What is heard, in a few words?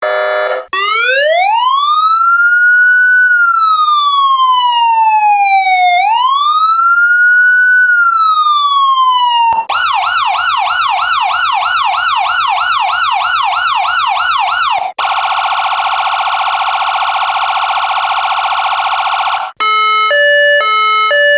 Ambulance Horn